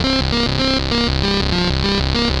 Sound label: Arp C Line, ARP Odyssey; Lines; synth; musicradar-synth-samples